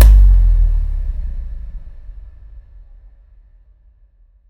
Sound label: GAME OVER; Ultranium 3D